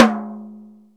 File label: open tom, Jazz; SN-R8-05 Jazz